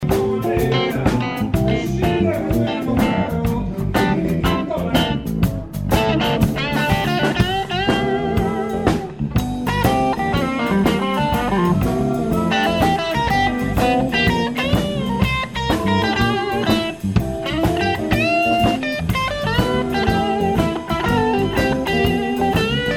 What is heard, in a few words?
Solo